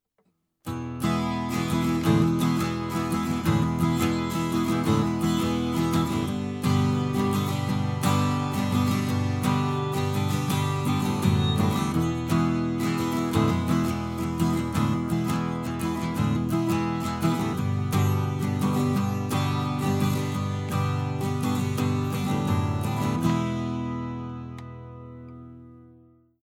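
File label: Guitar, Niere Preamp; Orpheus 0dB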